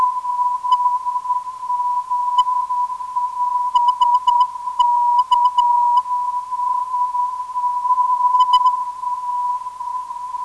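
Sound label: ZZA 348.94kHz, non-directional beacon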